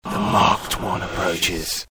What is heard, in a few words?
whisper